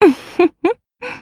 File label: ufufu